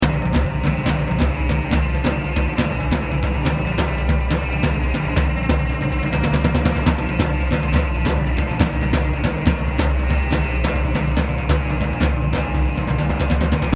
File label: Aggro Agg loop lead all drums; Outdoors Old